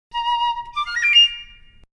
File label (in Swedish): kinesisk dans sms; divertiment